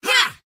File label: attack; Mad Mage; Mob Voice